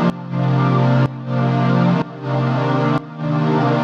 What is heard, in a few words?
Pad DS201 125 C, sidechained